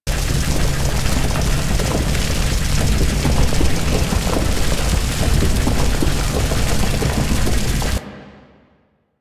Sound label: Digging Machine Loop; Ambience; Props; Loops; Warframe; Eidolon Plains